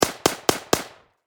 Warfare Machine Gun 7.62 Caliber Ak 47 Four Shots Distant Perspective